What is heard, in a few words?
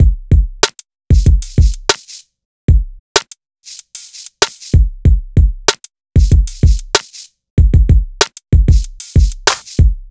Urban Beat, Drums